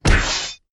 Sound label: Physical Strike